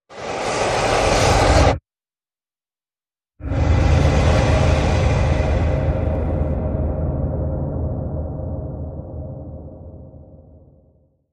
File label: One Last Breath